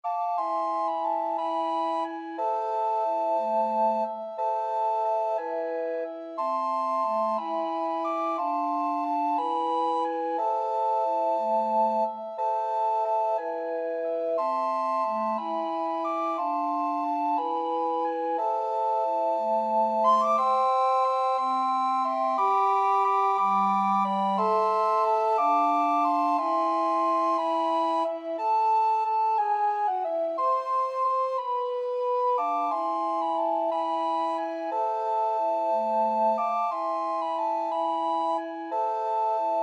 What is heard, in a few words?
recorder quartet, recorder_quartet